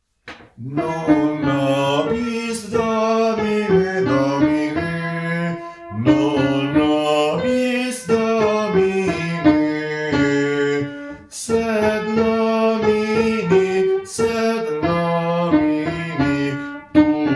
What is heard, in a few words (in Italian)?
soprani; Non nobis